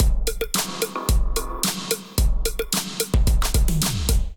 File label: Feel Right Kit Melodic 110 bpm, Drums; MIDI Clips; Drive and Glow; Ableton; Factory Packs